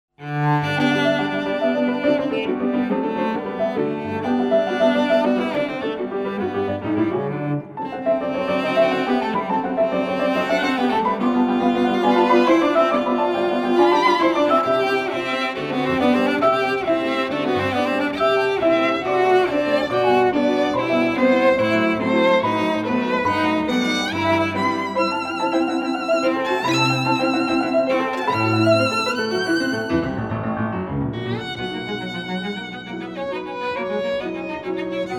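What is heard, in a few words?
piano trio; rubinstein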